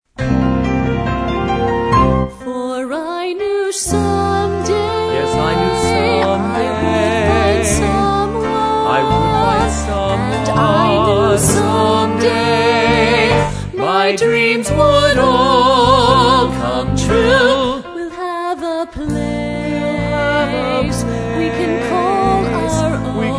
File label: Reprise Vocals